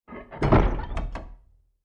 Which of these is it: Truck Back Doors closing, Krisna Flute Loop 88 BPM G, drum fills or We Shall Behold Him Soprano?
Truck Back Doors closing